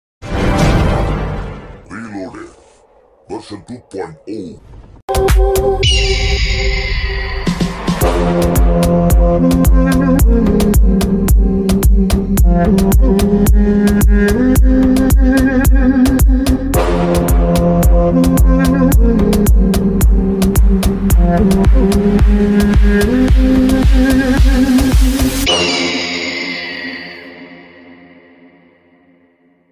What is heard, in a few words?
english ringtone, iphone ringtone; eagle